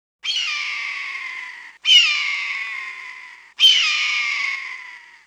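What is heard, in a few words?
ambience hawk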